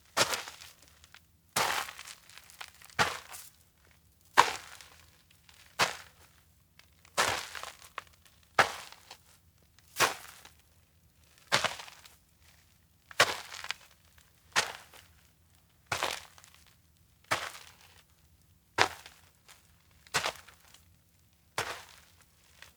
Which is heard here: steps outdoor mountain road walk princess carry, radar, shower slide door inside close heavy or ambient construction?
steps outdoor mountain road walk princess carry